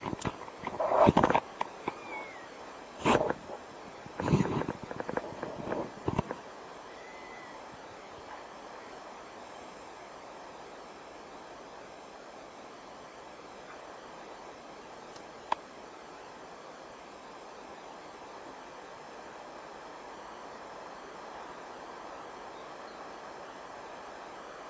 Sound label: northumberland juv depart